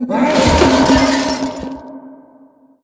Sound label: Shadow Death